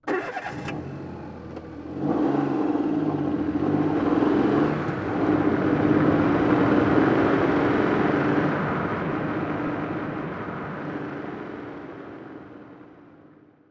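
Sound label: Car Starting Taking Off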